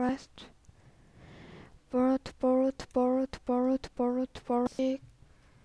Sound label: bullet